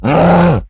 Grunt Bear

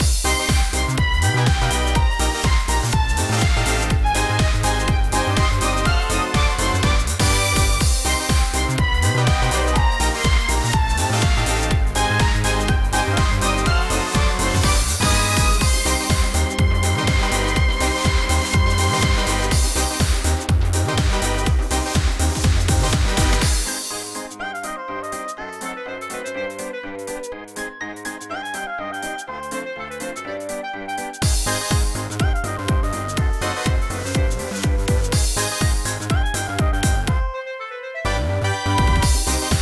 B Menu Event

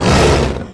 Monster Attack Finisher; Monster Ralph

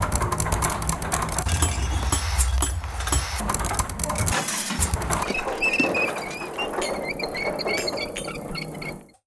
Amb Engin; Eve

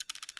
Ui Camera-Sounds

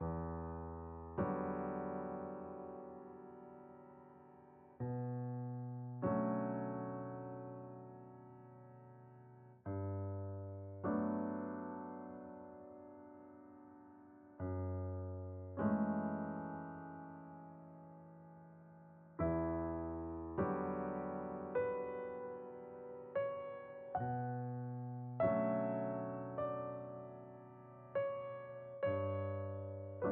Cutscene Dramatic

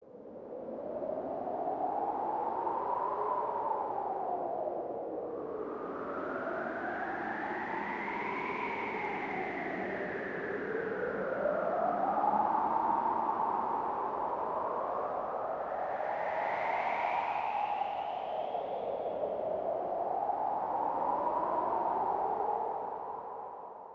Ambient Snowy; JumpStart Crazy Karts; Wii

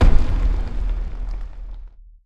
Door Open

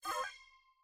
aquarium boss voice Kick; unsighted; enemies

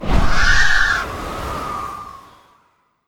Harpy Whirl; Legend of Keepers

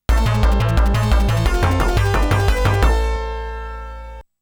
Game Is Over; Hellfire; the 16bit audiophile project; Genesis

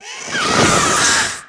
Enemy Firebird dead

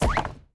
Obstacle Shoebox Remove; hello kitty friends match; android

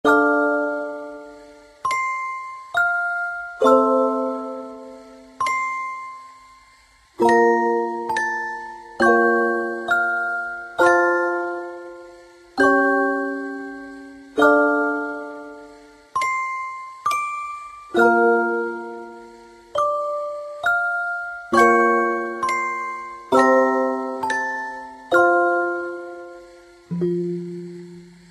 Music Box Song; menherafflesia flowering abyss; android

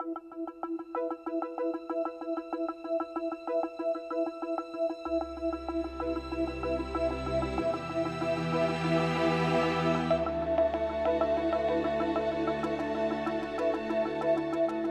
Music Post Race 95bpm; Forza Motorsport 6 Apex

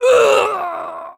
Male Death; gamerip; Mars Sortie

